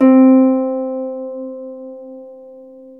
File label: Troubador Harp